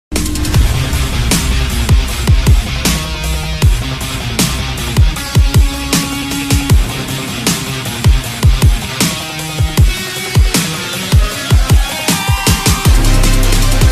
tamil bgm ringtone; thuppakki

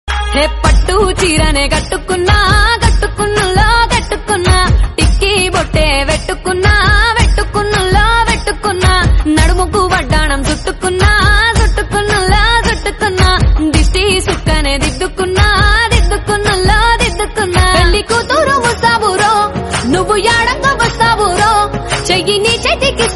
Folk Song ringtone; Telugu

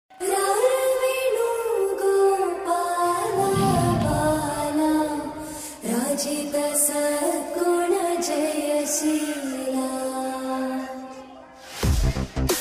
heroine entry bgm; Gully Rowdy

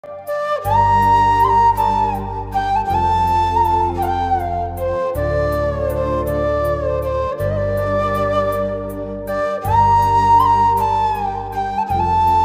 love ringing tone bgm

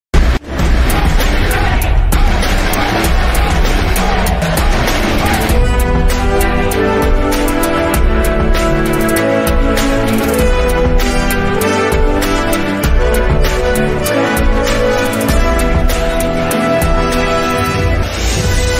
background music; jai bhim; lawyers strike

